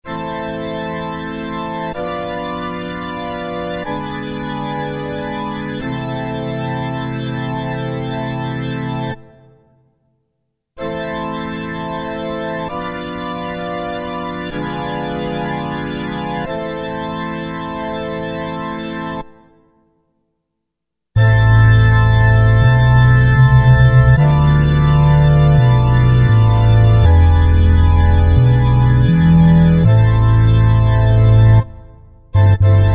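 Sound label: Pipe Organ Pedal, Alesis Z3; Sanctuary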